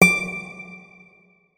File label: notes, guitar; Hideout Furniture